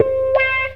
MEOW C, Distortion Gtr; PlatinumPhattCD2; E-MU Producer Series Vol. 8 – Platinum Phatt

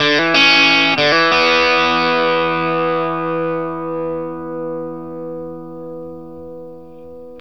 BLUESY E 60, Vintage Blues Guitar; USB Soundscan vol.22

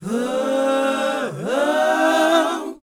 BOYZ, Vocal, R&B Dance

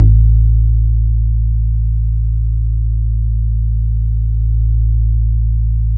BAS A1, 2 Step; USB Soundscan vol.51; House Side Of 2 Step; AKAI; BASS

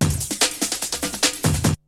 BREAKBEATS, TECHNO

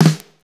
Snare, Fairlight CMI III

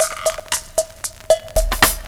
Bossa Back 115 bpm, breaks; Zero-G Beneath the Planet of the Breaks

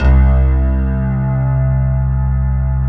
PNO HEAVN; EDENPNO

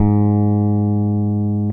BASS G#2, Electric Basses; PlatinumPhattCD2; E-MU Producer Series Vol. 8 – Platinum Phatt

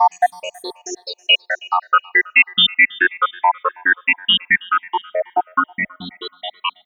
Synth Arp; Trip-Hop

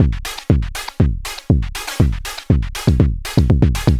Anabeat Mix, Beats

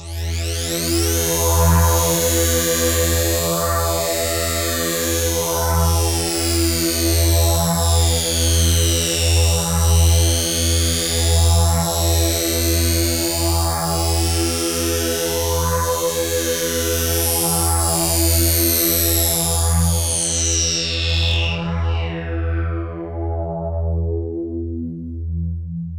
FX SWEEP

